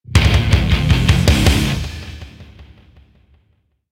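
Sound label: Multiplayer Match Starts; modern combat 3 fallen nation; android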